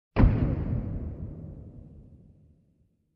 Warehouse Door slam

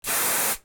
Hairspray Short

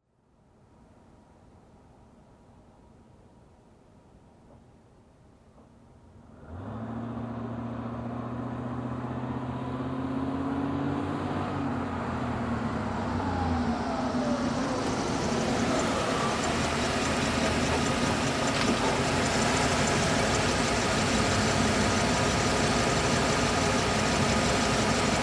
Truck approaching and parking

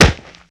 Kung Fu Punch on Mitts

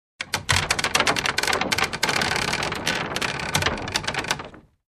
Wooden Equipment Creaks

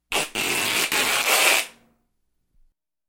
Scotch Tape packing

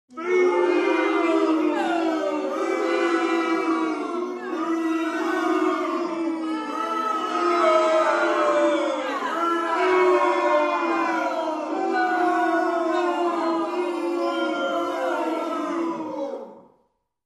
Crowd booing

